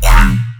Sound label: BASS SHOT